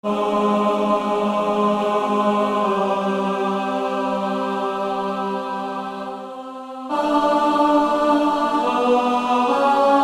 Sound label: Bari, barbershop tags